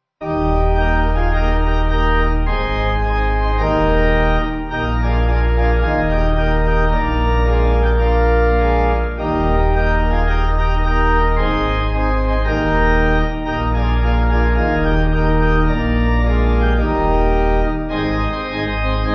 Sweet Are The Promises, Pipe; Organ; Ogden

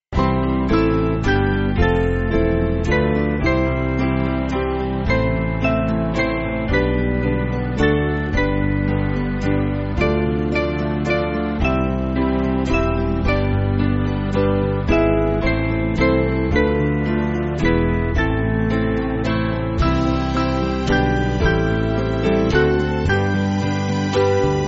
The Merits Of The Saints, Das Herrlich, S Band

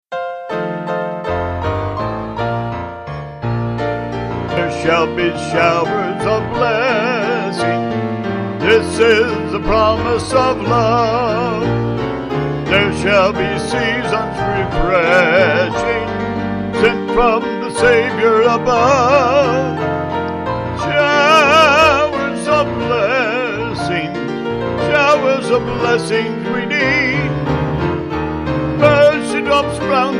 Vocals Piano; There Shall be Showers

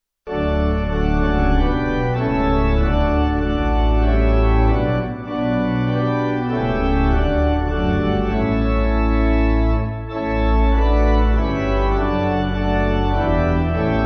Pipe Organ, Music Backing; Out Of The Depths; St Martin

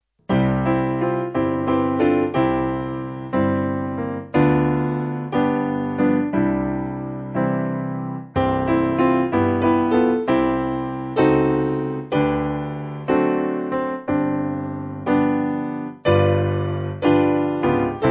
Tell Me The Stories Of Jesus, Piano; 5.4.5.4

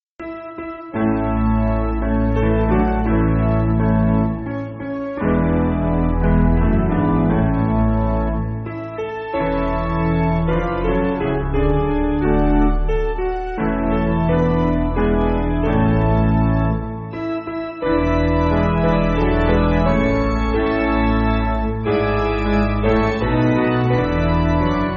Piano, Backing Files for Churches

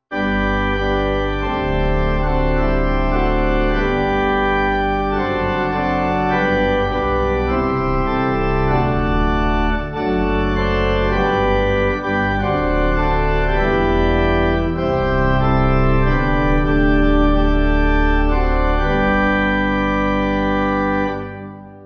Pipe

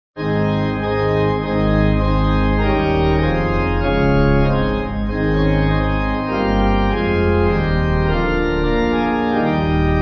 We Praise We Worship Thee, Pipe; Organ; 6/Ab

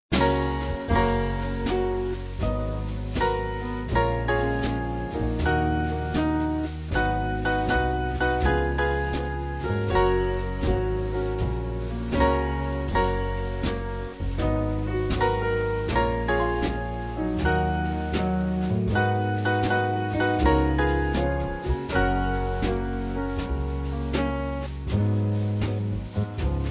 African American Slow Band; Swing Band; 3/Gb-G Slow

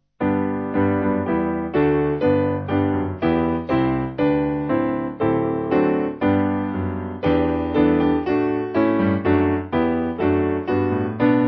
Piano, Free Music Backing Files for Churches; Freedom And Life

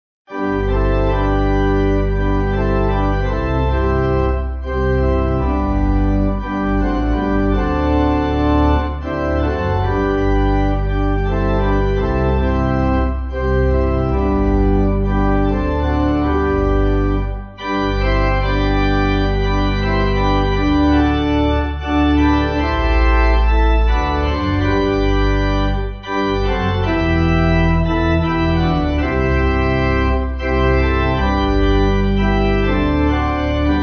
Pipe

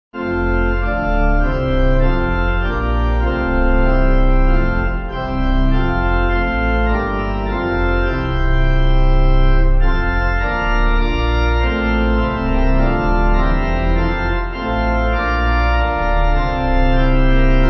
Pipe

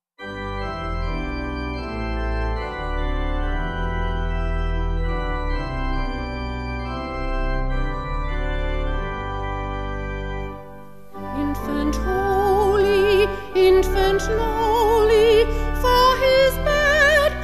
Vocal Organ; Infant Holy

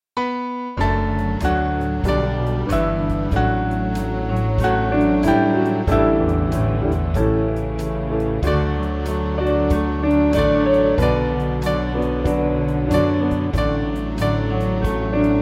Band; Quam Dilecta

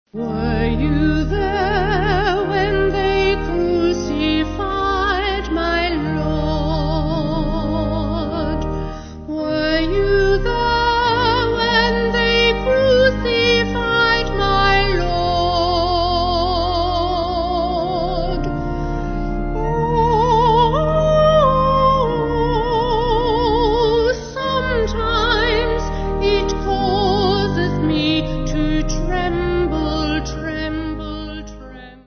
Vocal Organ

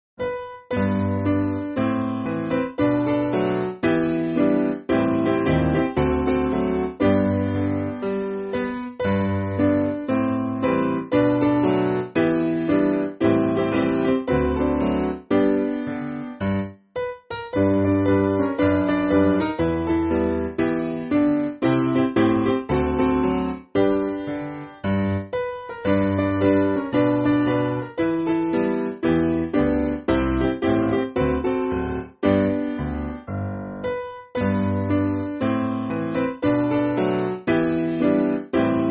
Spiritual Piano; Its Me Its Me

